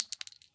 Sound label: casing fall, Guns; Weapons, Casings